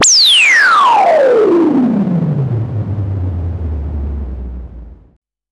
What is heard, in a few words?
Modular, Filtered Noise; noise hiss crackle